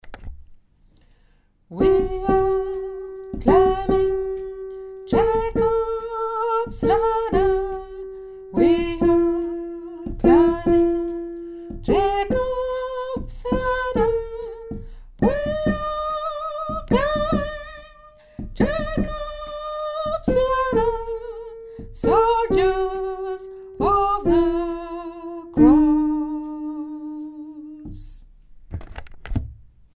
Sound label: jacobs ladder soprano